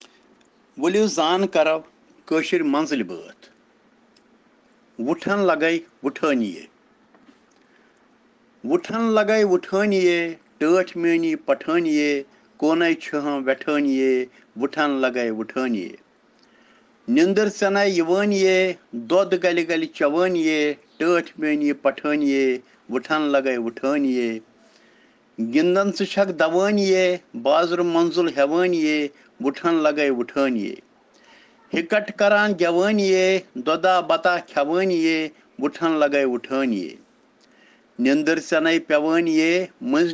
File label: Children Rhyms in Kashmiri